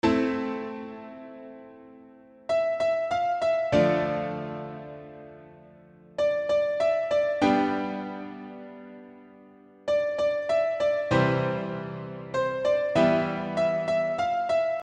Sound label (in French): melodie et accord